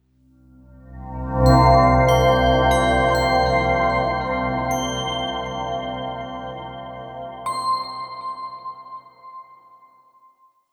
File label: Corporate Transition